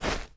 Pet step

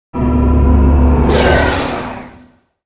Soul Scream

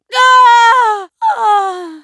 death

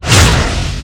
Dark Slash effect

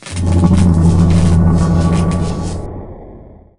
Elemental inquiry effect

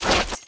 step

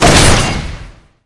Fast Blow effect

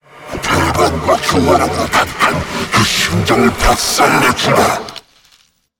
lich skill

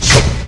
Dark Slash effect

